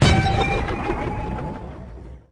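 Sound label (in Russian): инопланетный минометный выстрел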